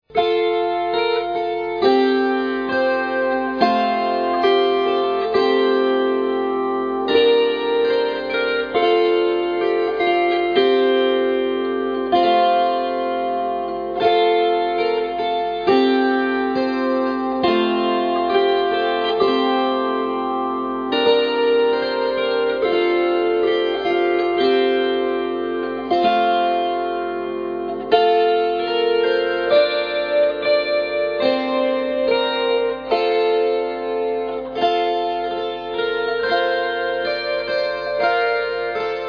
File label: Gavotte